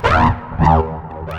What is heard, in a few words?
lick loop